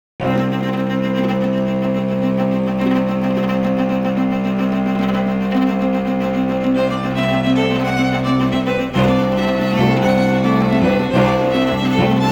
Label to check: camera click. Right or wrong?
Wrong